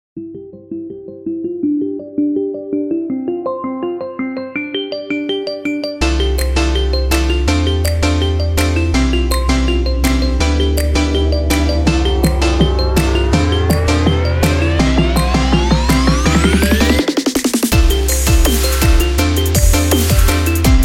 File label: Ringtone